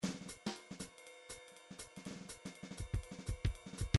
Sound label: midi grooves bebop